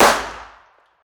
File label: fire dist int pistol light; gmod; arccw uc commons attachments